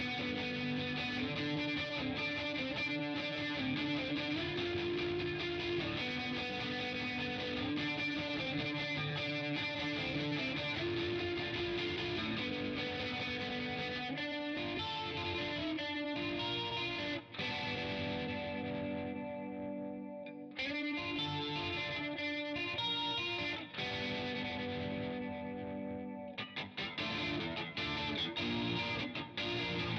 gtr raw

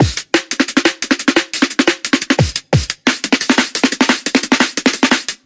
Drum Breakbeat Sample, drum and bass

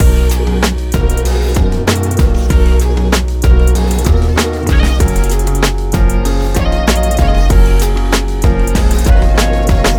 Full Mix, modern hip hop

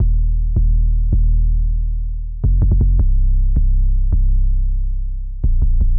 808 After EQ, hyperpop beat

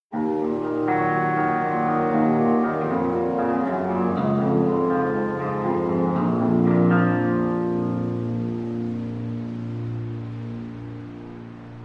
Reverb bit limited

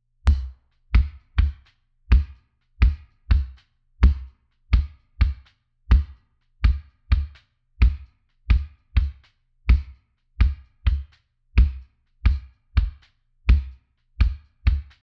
Kick L Phase

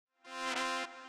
Brass pop rap

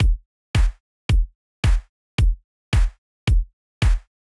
Basic Drum Beat Unmixed, pop rap

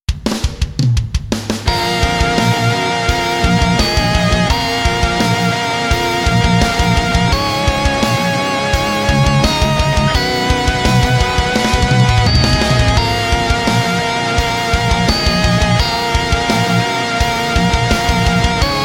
Synth Voicing Default Depth

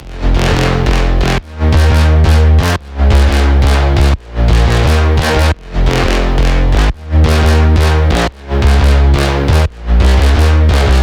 Main Bass, drum and bass

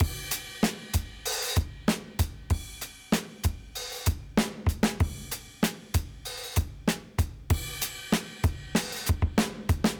Live Drums Processing, modern hip hop

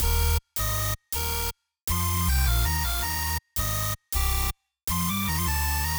Bassline, hyperpop beat

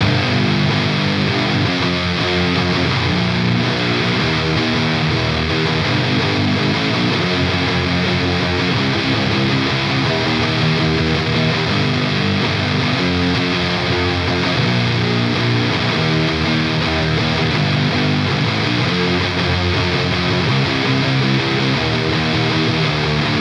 Chorus Rhythm Guitars, modern metalcore

